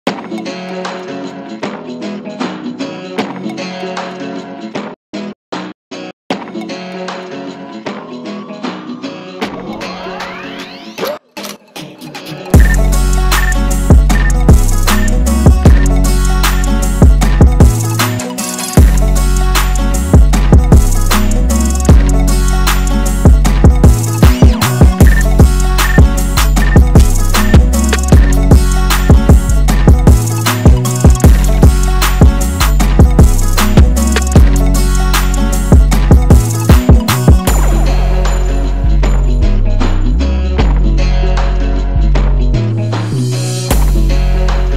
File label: trap remix; far cry 5 theme song